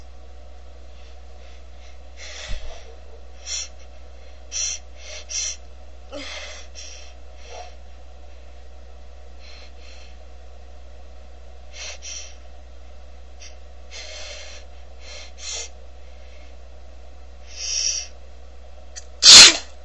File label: big build up, female